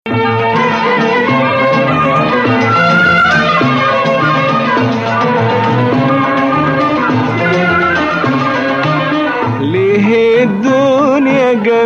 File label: Nawa Bayati